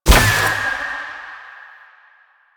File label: Choir Bow Fire; Warframe; Weapons